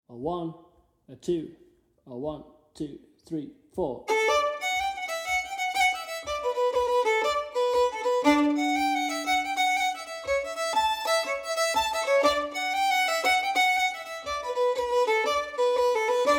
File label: Fiddle Full Speed